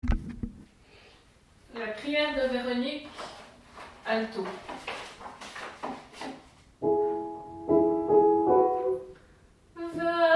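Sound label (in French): prière de Véronique alto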